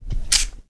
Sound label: colt draw